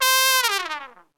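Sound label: trumpet long fall; OMI - Universe of Sounds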